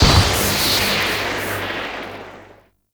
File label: Lightning Machine Strike; Ambience; Props